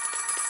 count Up Loop; netent

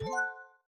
spin button; netent

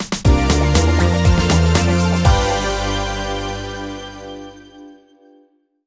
Freespin exit; netent

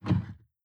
reel stop; netent

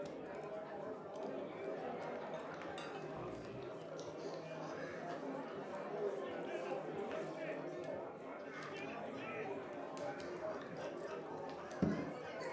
ambience tavern; netent

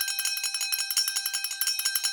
coin count; netent

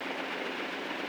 reel Spin

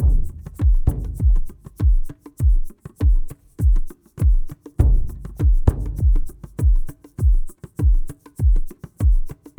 main theme percussion long; netent

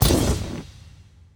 lamp Land

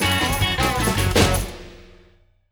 medium Win

